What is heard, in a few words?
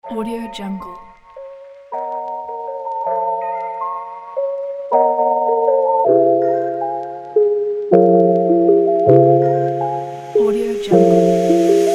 modern upbeat trap beat